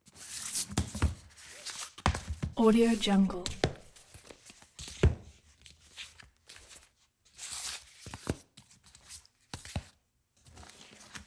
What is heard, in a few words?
int book sorting; collanderson